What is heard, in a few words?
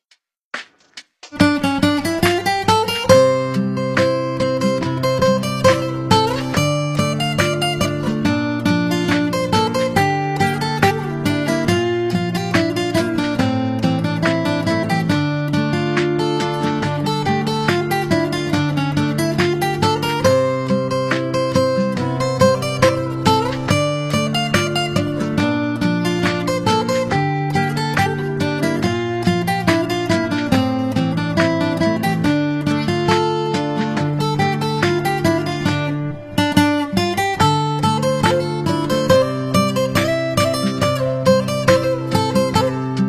Modan Han Gettar Music Uyghur